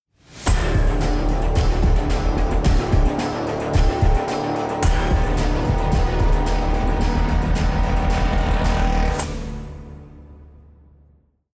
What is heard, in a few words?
round ten sec count, music